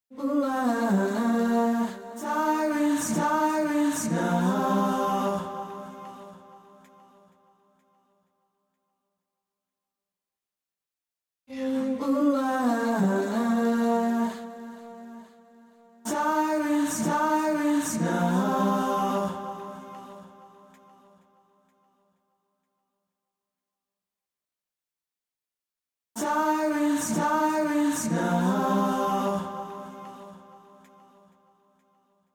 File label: Sirens